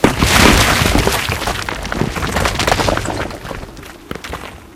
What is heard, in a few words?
break stone